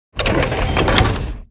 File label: Heavy Tank Cannon; blue archive